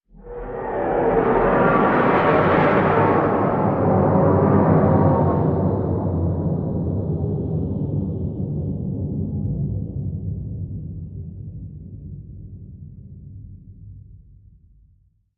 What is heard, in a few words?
Fly By Tailwhip